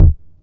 SP1200 KIC, Kix Snares; E-MU Formula 4000 Series Vol. 1; Hip Hop Nation

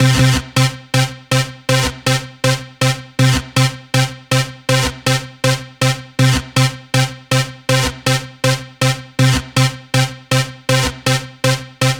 Dance Synths, Hardcore Loops, Synth Loops

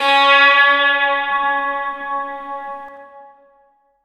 suntur, stringed instruments; Sonic Foundry (Sony Creative Software); World Pop

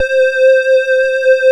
SYN SQRS, JV 1080; Pro-Rec - Synthsonic

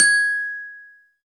TUNED PERC; Greytsounds; EXOTIC PERC

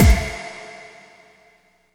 END, Tekno Industrial; East West

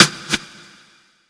STICK; East West - Phat & Phunky CD2; 90's Sample CDs

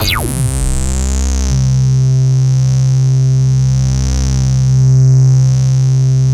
EFFECT, Explosive Jungle

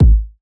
kick drive

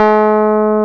KEY MKS20, Pop Pianos; Pop Pno+MKS

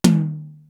tuned om tom; East West; Global Sounds

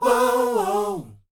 SHOO BE, Vocal R&B Dance; Spectrasonics Vocal Planet

